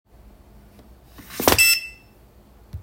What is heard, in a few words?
BEEP, Magstripe Card Reader